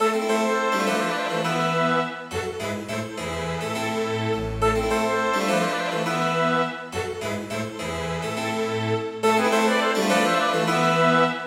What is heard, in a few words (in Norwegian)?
Rigadon for to oboer, to fagotter, strykere og cembalo; Bang